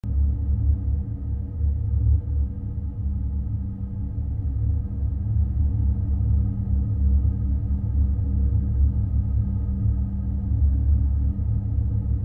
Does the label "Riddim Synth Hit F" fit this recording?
No